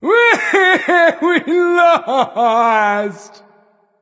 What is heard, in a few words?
boohoo; smite; hou yi